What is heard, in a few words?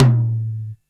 Tom Mid, Drums, Roland MT32